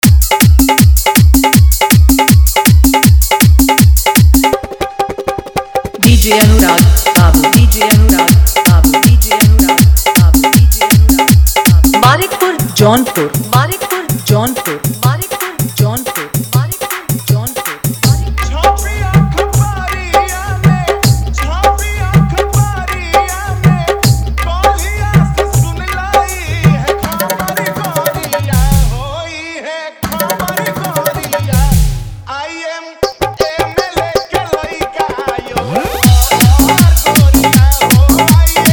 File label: Fully Dhollki Bass Remix